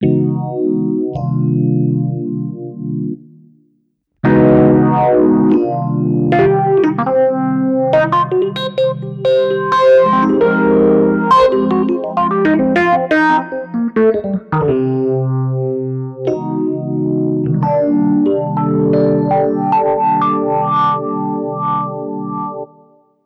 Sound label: synth